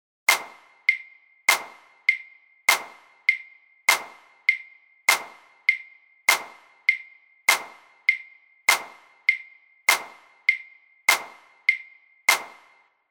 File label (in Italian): accenti; esercizio; Sonora vol A